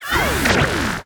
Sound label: Laser CHARGE fast; SFX; Cielonos